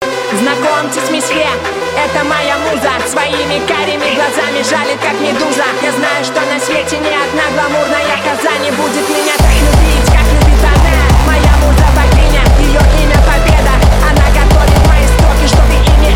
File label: hardstyle